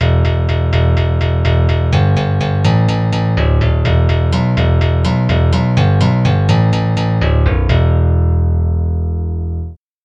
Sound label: steel string guitar bass